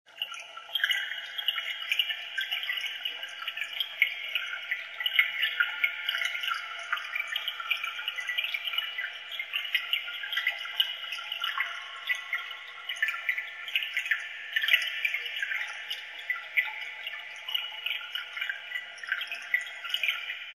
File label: cave sounds